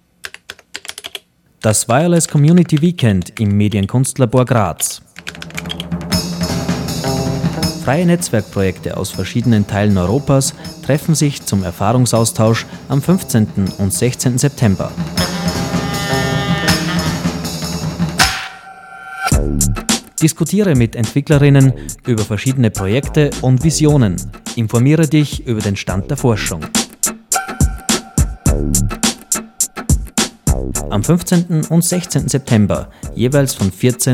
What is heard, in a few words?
jingle lang; FunkFeuer Labor 2007; graz07